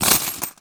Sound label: coins bag